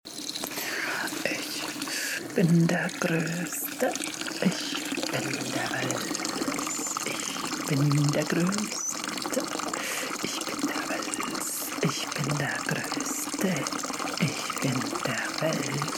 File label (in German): Soundscape